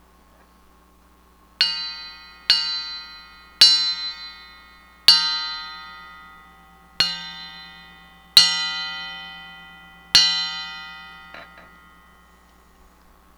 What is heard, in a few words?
old Tone Ring, banjo